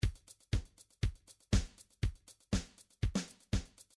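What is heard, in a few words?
midi drums paradiddle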